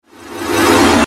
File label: Electrical Whoosh, Electrical, Whoosh, Pass, By, Science Fiction; 5.1